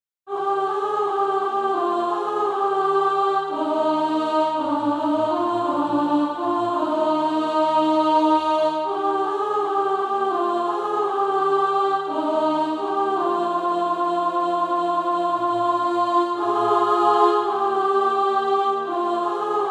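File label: alto, SATB Practise Music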